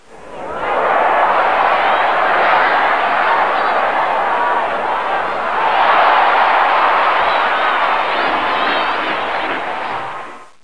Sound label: crowd; Know Your Baseball - Greatest Player